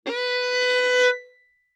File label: Violin arco ff sul G B4; MIS Pitches - 2014; Strings